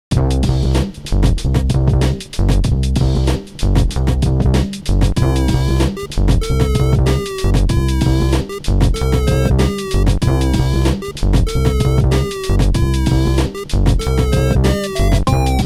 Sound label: instrumental